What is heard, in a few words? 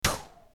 hit; Assemblee SFX